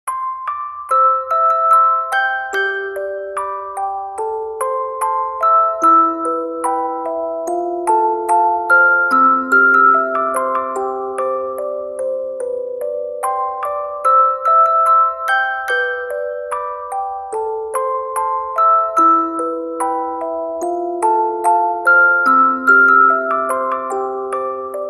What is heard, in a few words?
Music Box